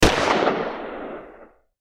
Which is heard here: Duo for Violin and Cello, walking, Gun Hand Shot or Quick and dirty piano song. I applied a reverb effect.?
Gun Hand Shot